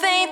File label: vocal